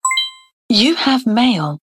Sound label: e mail ringtone; idroid